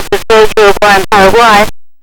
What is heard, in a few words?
CYBER VOX; Big Beat Samples & More; AKAI; VOX FX1 KIT